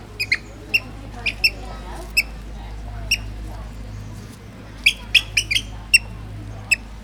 Recurvirosta avosetta group; Pied Avocet; Calls within a flock at feeding and feather cleaning

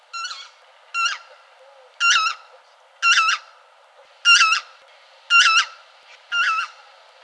Ancer erythropus loud; Lesser white-fronted goose Anser erythropus; The loud calls of a bird who is walking to its pack; The quiet calls of a single bird from water

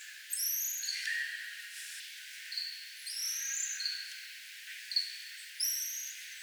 Callithrix jeoffroyi; Jeoffroyi marmoset; The quiet trilling calls of an adult animal

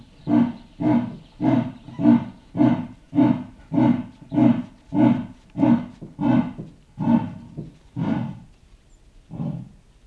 Panthera pardus rut; Far-East Leopard Panthera pardus orientalis; A Call series of a male in rutting period

